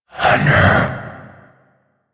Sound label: Possessed Vocal Attack; Warframe; Priest Quest; Possessed Red Veil B